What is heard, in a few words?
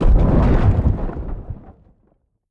farmhouse shake thump